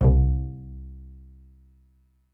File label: pizz C1, pizz section; Greytsounds VOL-1